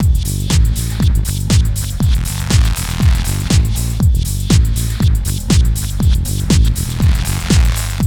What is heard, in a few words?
GHET ROL; Best Service; Advanced Media Trax Building Blocks; GHET ROLL